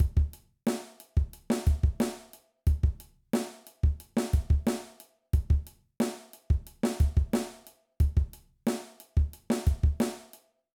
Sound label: Rock Drum Beat